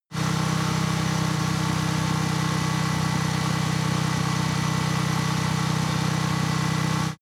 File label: motorcycle engine medium low constant rpm ascending; motorraeder; verkehr transport